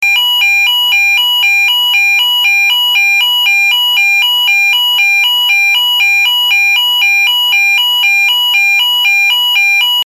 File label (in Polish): ton